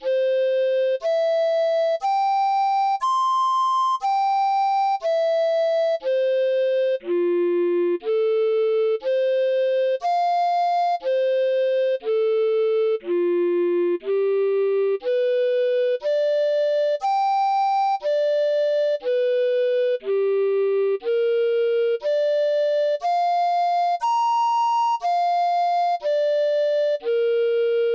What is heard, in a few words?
Arpeggios, recorder